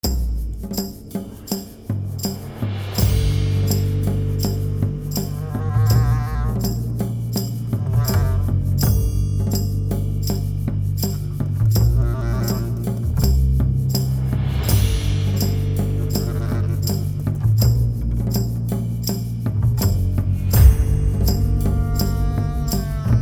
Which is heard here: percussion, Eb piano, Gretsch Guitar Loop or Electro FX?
percussion